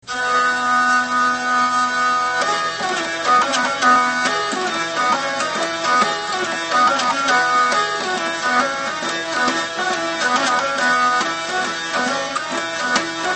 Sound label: hurdy